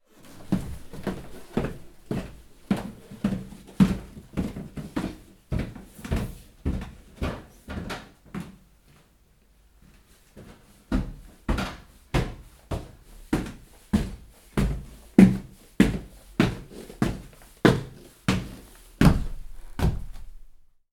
Stairs Slowly

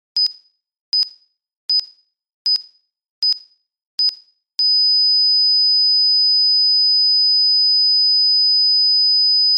Heart Monitor Beep

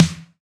Snare

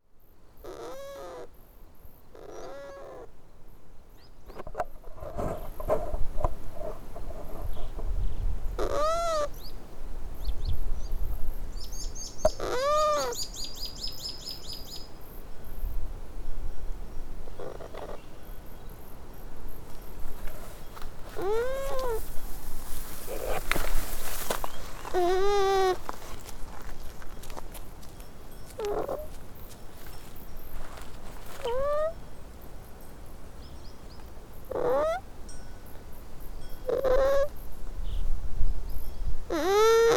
Llama; Merlo San Luis Arg

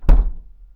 Door Heavy Closing

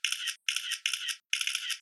Iphone Flash

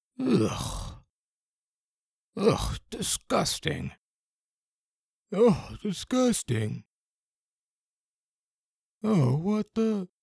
Disgusting Grunts Multiple

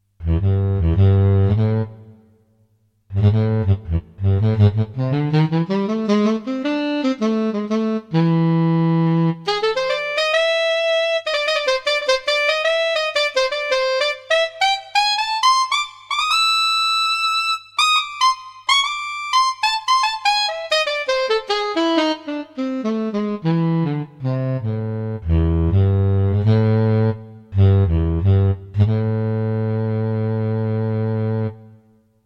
Pure Saxophone Sample

